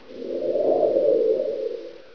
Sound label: squall; ambience